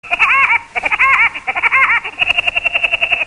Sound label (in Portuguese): risadinha; pica pau